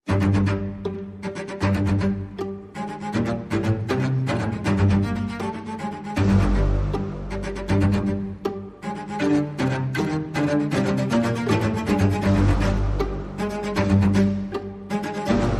Light Percussion

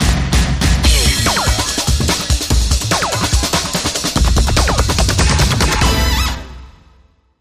Band Ramp

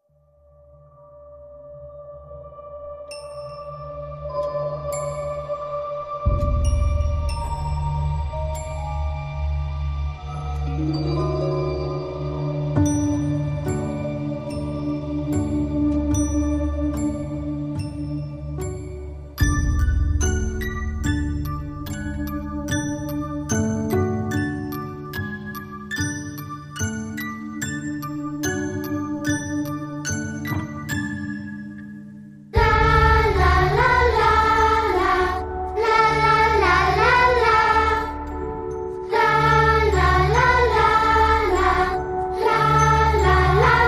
Extended Choir Only

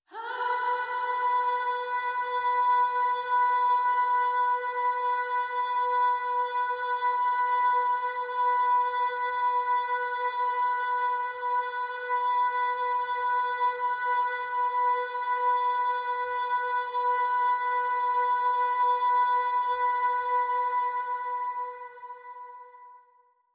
Pop Vocal B, No Scoop

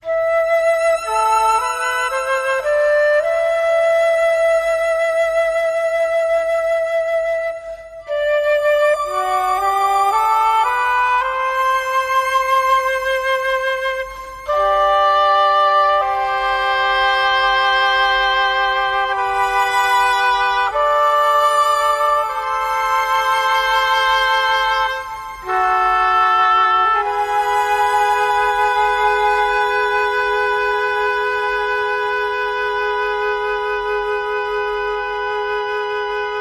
Violin & Woodwinds

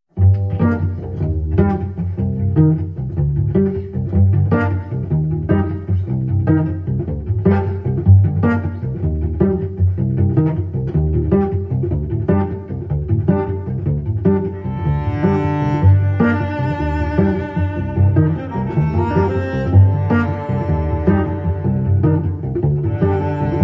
Cello Suite 2 Variation 3

